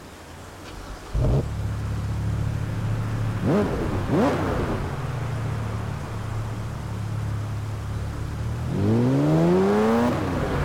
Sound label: alfa romeo brera; TDU-1